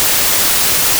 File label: noise